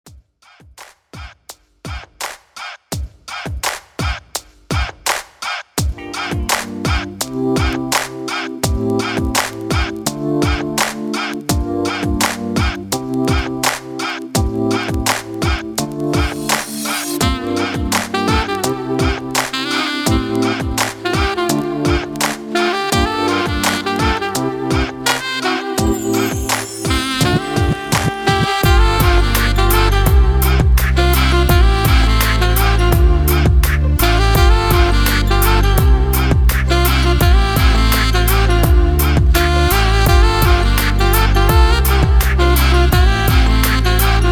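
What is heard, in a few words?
Saxophones Instrumental 84 BPM; REDRUM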